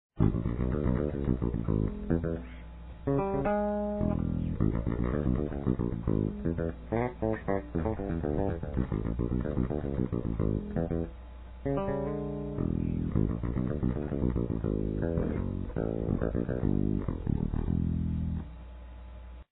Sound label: funky